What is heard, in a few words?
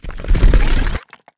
stone rush